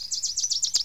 Wilson Warbler

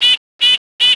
nuthatch eh

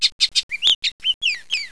bullock oriole

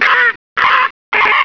great blue heron

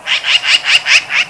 magpie

